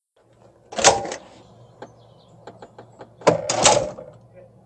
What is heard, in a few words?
door